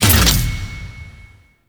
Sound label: challenge complete; Veilbreaker; Kahl